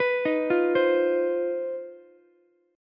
C Minor Major 7th Double Flat 5th C down Ukulele; arpeggiated; JGuitar

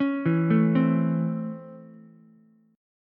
Db Minor Major 7th Sharp 5th Db Ukulele; 6,4,5,3; arpeggiated

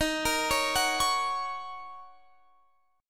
D sharp Minor 7th D sharp, down, Guitar, Standard; arpeggiated; capo=2

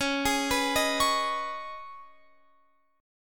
C sharp 7th Suspended 2nd, Guitar Open F; C#7sus2; arpeggiated; guitar chord

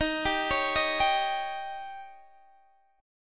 D sharp 7th D sharp; D#7; arpeggiated

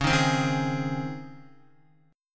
Db Major 7th Suspended 2nd Db Guitar; strummed; chordshape; JGuitar

